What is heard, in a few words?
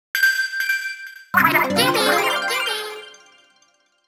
News Jingle; gamerip; Splatoon; Inkopolis